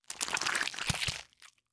gather meat expire

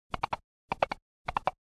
step, mounted movement

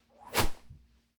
sling attack

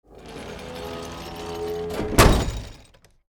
gate, complete; 0AD

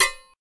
shield metal, attack impact

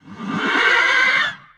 horse death; fauna

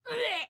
female death

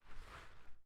hoe, farming

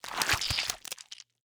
gather meat expire

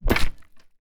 pike attack

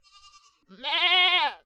goat, death; fauna

